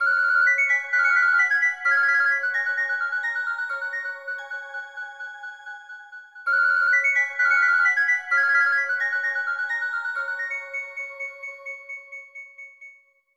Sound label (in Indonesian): Nada Dering Nokia Jadul; Elves